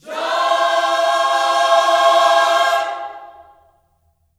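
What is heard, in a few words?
JOY C major, Vocal Gospel; Spectrasonics; Vocal Planet Vol.1 Gospel; akai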